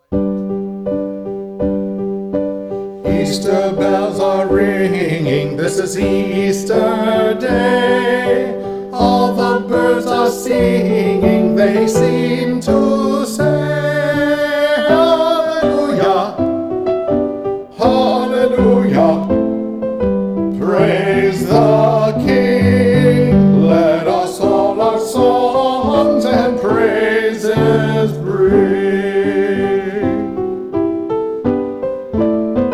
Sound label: Tenors and descant, choir; Tenor Part (Left Channel) & Women Descant Part (Right Channel); Palm/Passion Sunday Service